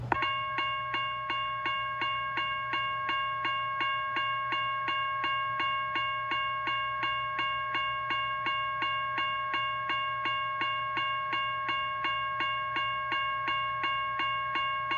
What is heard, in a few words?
Electronic General Signals Type 1